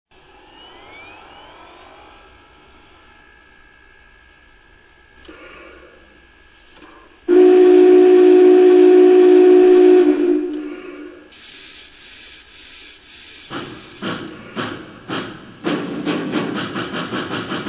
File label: Big Boy, trains